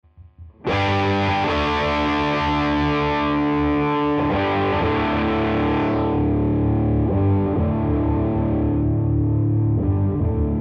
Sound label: TARS Cutoff